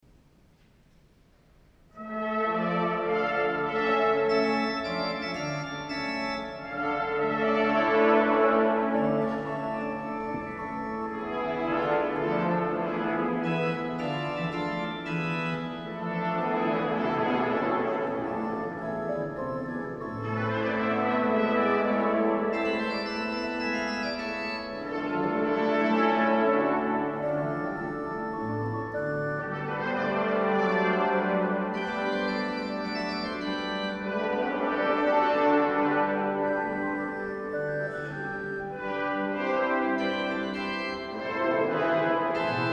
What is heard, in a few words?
Echo Fantasie; Samuel Scheidt